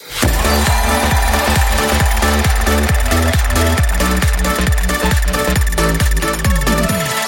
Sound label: Big Win